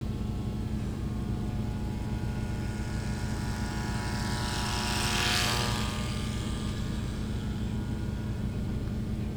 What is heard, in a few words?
spinning; rhotuka